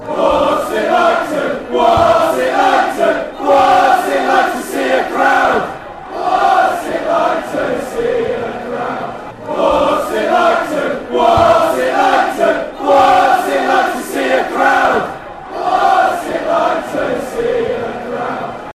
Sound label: crowd, chants